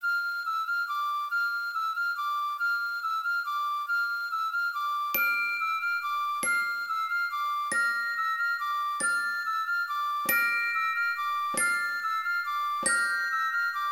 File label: Carol of the Bells [140]